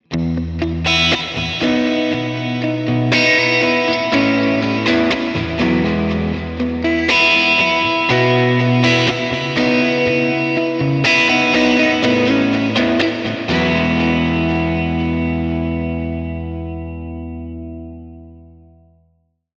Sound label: Stereo Direct Rig FX LOOP